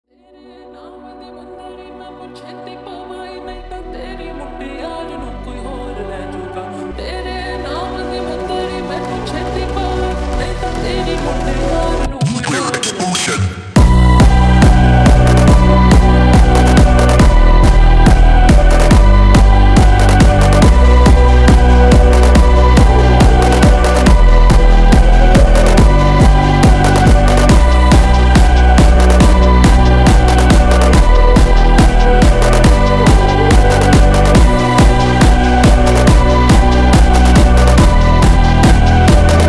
speedup

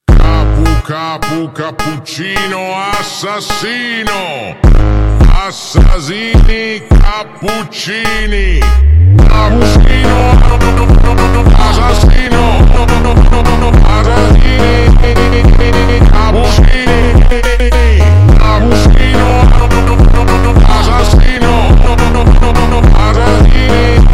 Funk slowed

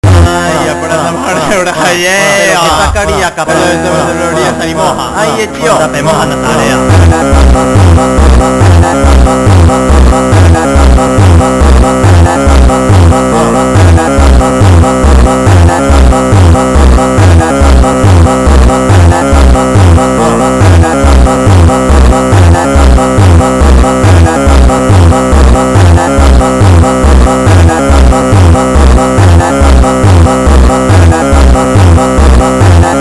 Phonk

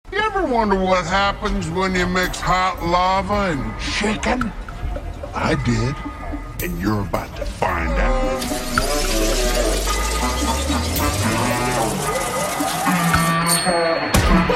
Funk super Slowed

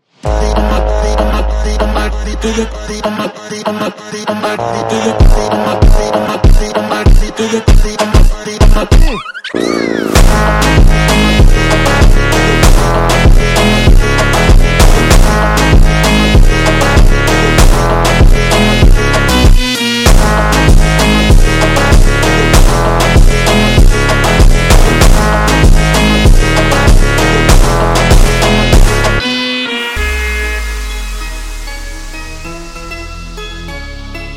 Funk ultra Slowed

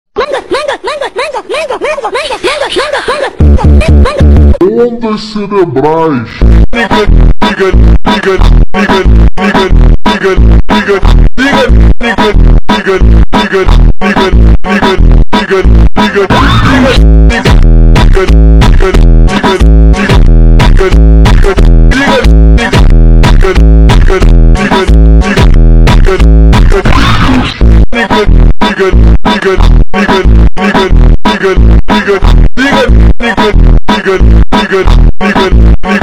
MONTAGEM FUNK (Slowed + Reverb) superbass

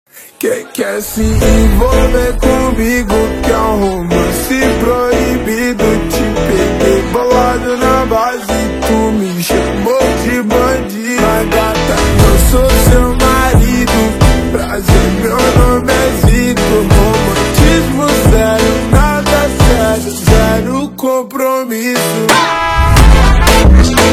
ROMANCE FUNK - Slowed

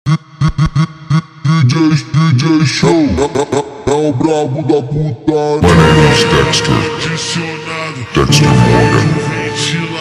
Funk ultra Slowed Reverb

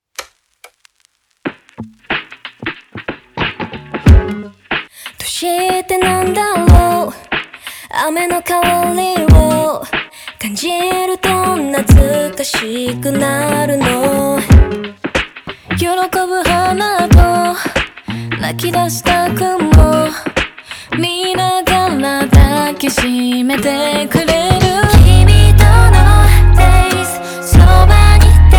Raindrops bassboost

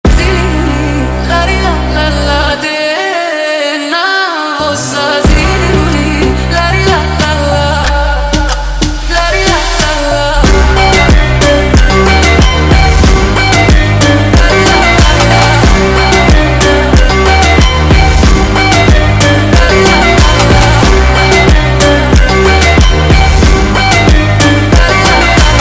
Funk sped Up

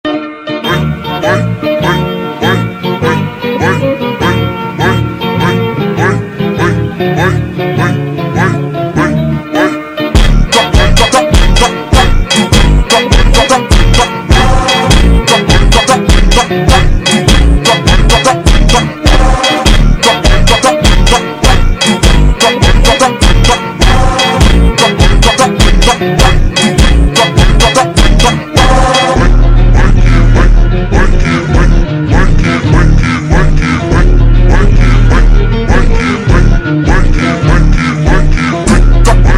Funk ultra Slowed

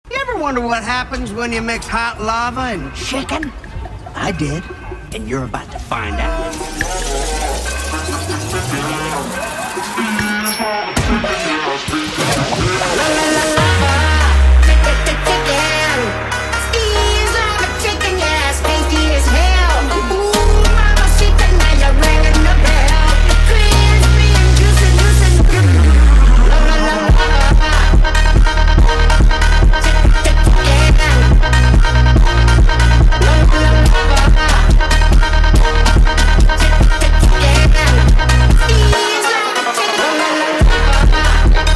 Funk speed Up

